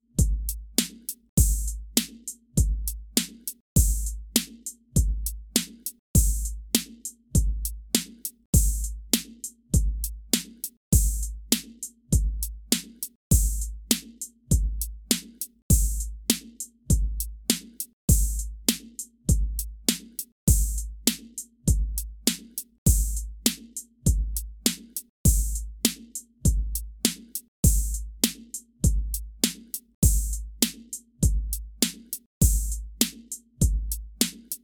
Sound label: Beat